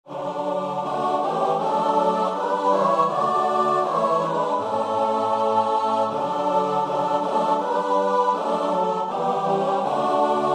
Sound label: duke street, choir